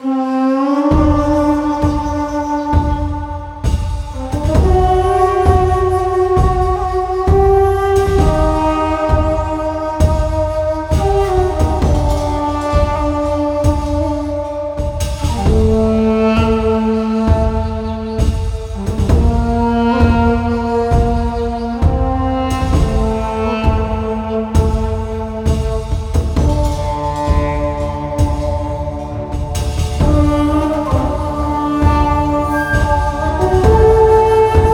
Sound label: Music Warrior Funeral; Knights & Guns